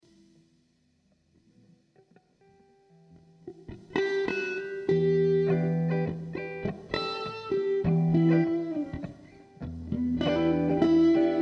Em 100 GTR Jam

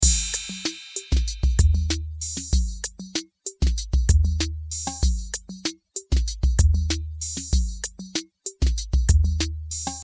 beat

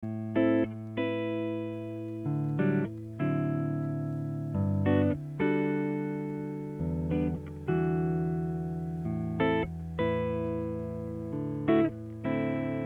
Jazz Noodles

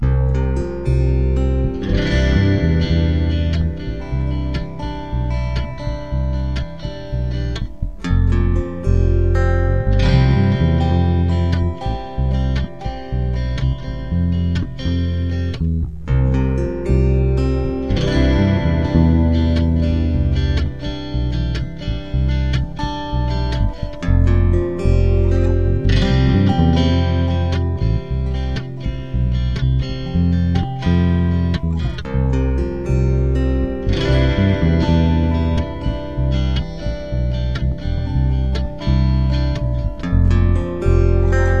It Strums in C (Duet)

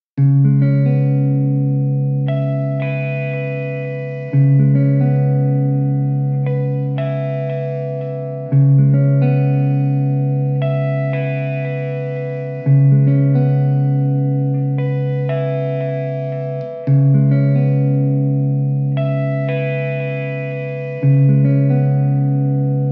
Chill Bass GTR

